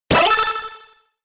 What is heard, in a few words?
letter, pinball